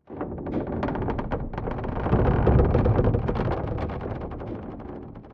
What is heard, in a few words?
Dock Creaking; silent hill homecoming 2008 gamerip pc ps3 x360